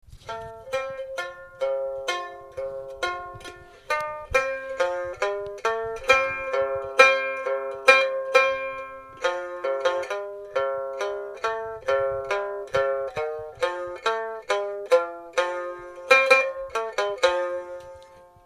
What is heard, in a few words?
kankara